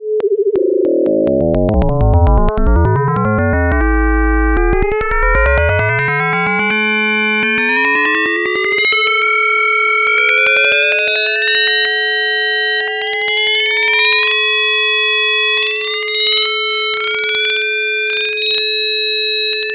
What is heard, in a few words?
sine 2op FM